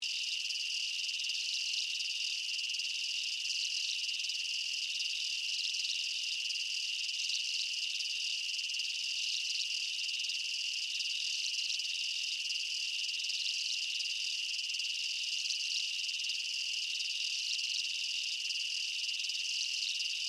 Animal, Ambience, Insects, Chirps, Night, Jungle, Bugs; Forests And Jungle